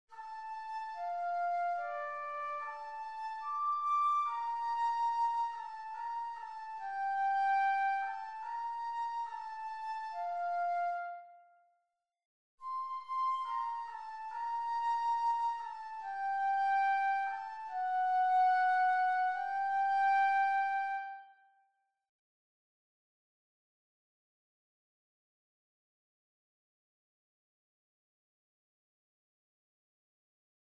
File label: Flute Excerpt